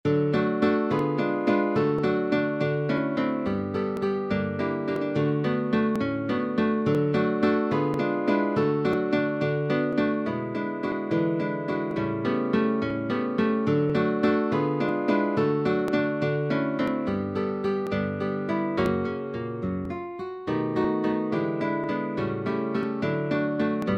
waltz; pierette

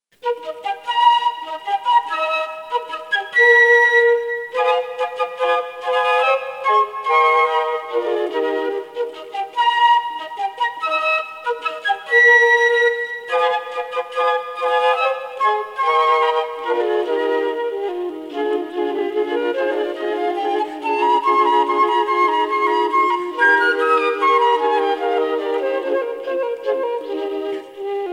waltzes for four flutes; seven waltzes; weber carl maria von

